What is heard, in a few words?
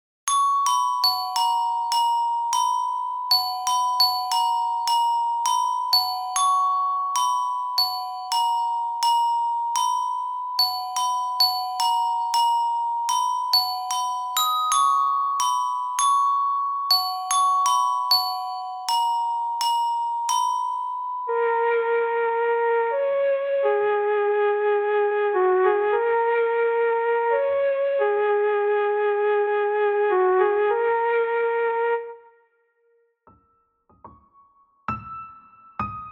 Games music